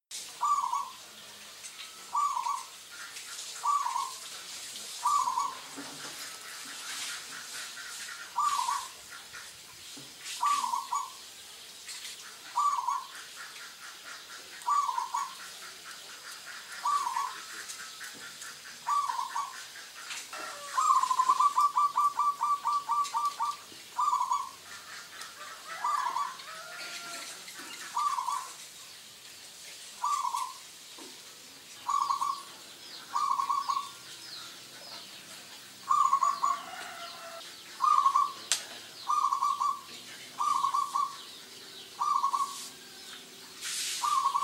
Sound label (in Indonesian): suara perkutut lurah